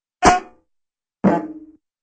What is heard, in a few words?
aluminum bonks